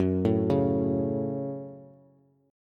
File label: Gb Minor Bass; arpeggiated; JGuitar